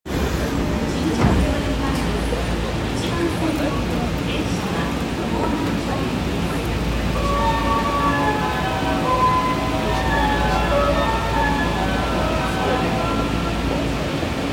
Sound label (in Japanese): 丸ノ内線新宿御苑前西